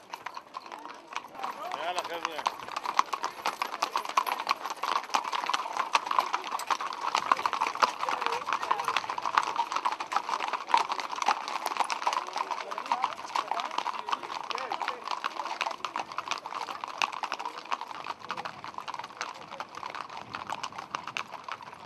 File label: Horses In Israel